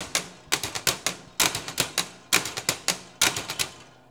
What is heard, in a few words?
TESLA, Science Fiction